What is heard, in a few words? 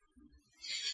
soft crying